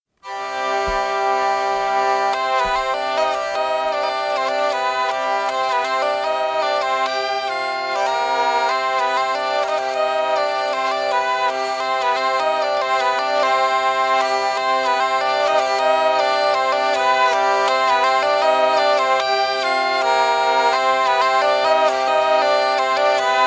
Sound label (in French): scottisch lent; noel